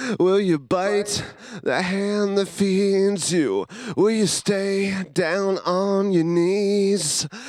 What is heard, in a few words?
lead vox